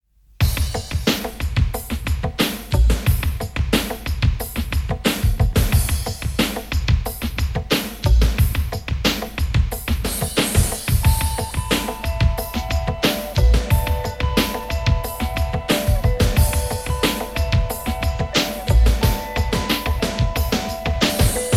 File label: Belgrade Beat